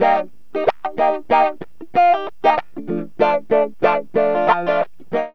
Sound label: GTR, CHANK; Zero-G; Funky Elements